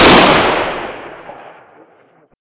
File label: ak47 fire; transformers; cstrike; cs16; weapons